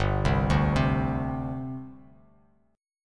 G Suspended 4th, Bass Standard; arpeggiated; 3,3,0,5; JGuitar

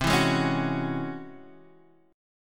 Db Minor Major 11th C

